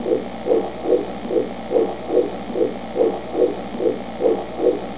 beats

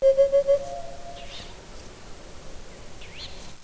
bird ambience

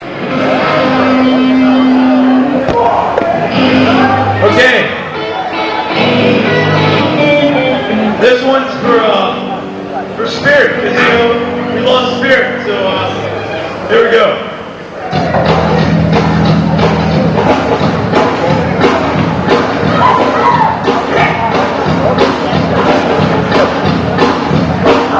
fight song

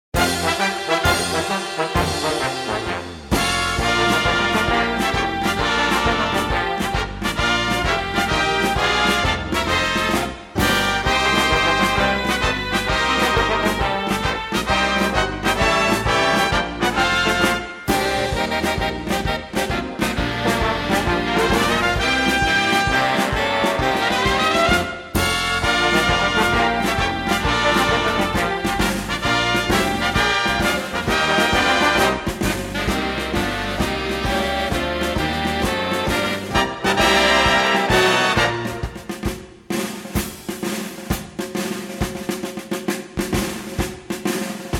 Fight Song Combo

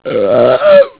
larynx

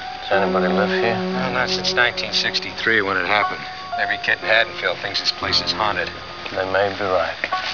haunted

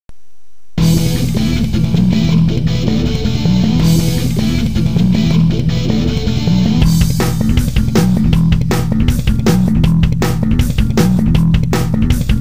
slap, bass

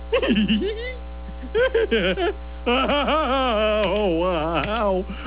laugh

